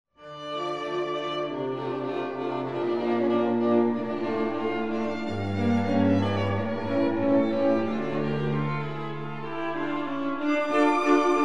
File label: string quartet; mozart; kv 421